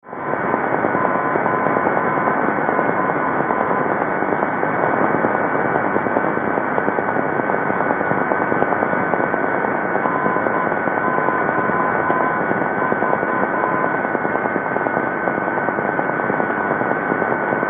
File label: NDB Novara, Italia, 292 KHz